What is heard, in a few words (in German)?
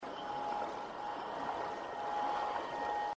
wasserhexe; inside